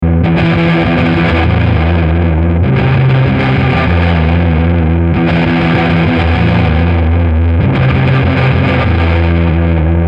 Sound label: distortion verb, reverberation machine; deathbyaudio